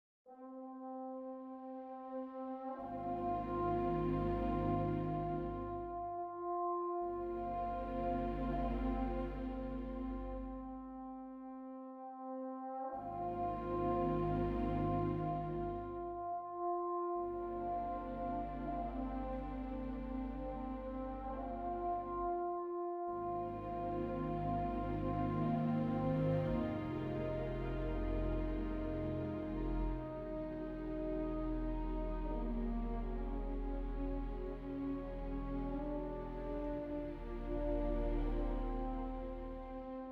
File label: piano